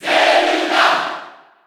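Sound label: Cheer Japanese, crowd; Zelda; SSB4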